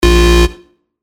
A#, bass shots; Abacus; revealed bass shots vol 3

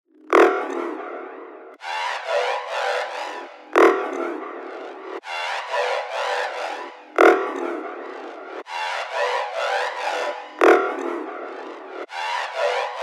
FX Loop, 140 BPM; Predator; revealed fx loops vol 2

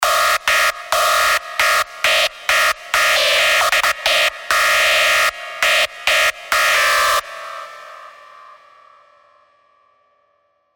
Screech; Screech Zyprexa Wrexa; Revealed Serum 2 Rave Asylum

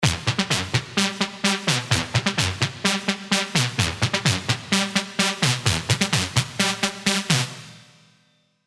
Hot Brass; revealed serum 2 dirty dutch vol 1

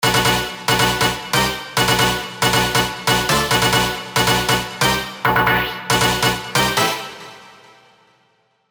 Stab Sonic Destroyer, big room techno drops; revealed serum 2 big room techno drops vol 4

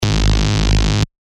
G#, bass shots; Blueberry; revealed bass shots vol 3

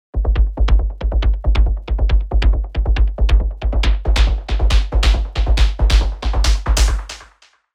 Sub Perc, techno bass; Spire; revealed spire techno bass vol 1

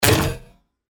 G# Sixiang, percussion; revealed percussion vol 2

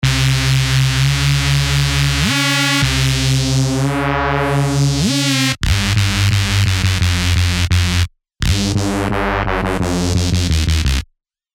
Reese, Sylenth1; Calling Me; alonso 18 year anniversary pack

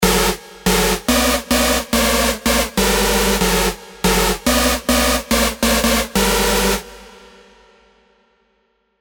SY Stab Harsh, sylenth1 hard techno; revealed sylenth1 hard techno vol 1

